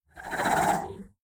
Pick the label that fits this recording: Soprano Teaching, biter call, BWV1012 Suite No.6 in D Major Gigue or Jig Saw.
biter call